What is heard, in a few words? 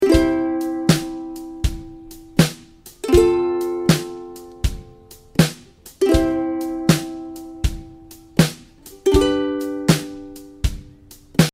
strum, ukulele